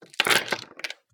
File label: Scrabble, Shotgun, Tiles, Drop; UberDuo; Game Night Audio Props; GAMEBoard